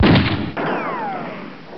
gunshot with ricochet

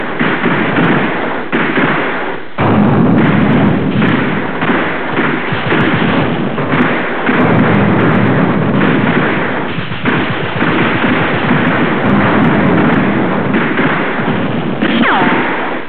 gunbattle includes machinegun

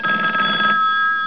tone phone ring

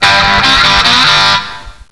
instrumental lick

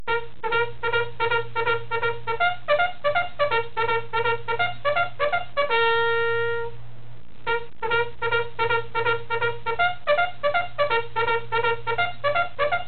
instrumental trumpet charge

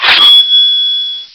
swords contact ping

